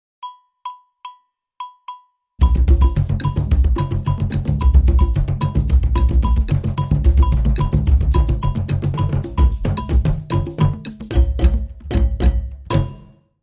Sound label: Festival Drums